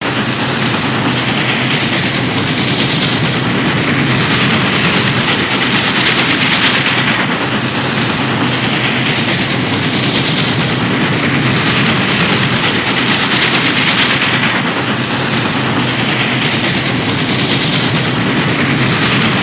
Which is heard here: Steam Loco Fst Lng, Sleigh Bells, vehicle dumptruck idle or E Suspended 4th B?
Steam Loco Fst Lng